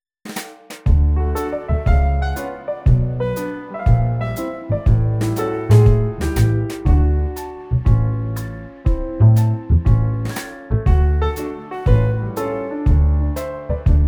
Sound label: instrumental